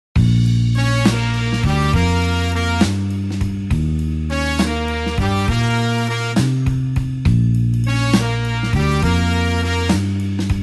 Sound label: blues horns slow Bb